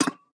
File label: Peg Leg Footstep